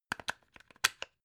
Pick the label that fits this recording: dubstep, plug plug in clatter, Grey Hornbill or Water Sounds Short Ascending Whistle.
plug plug in clatter